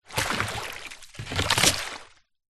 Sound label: foley wood stick large movement drag in puddle water; zapsplat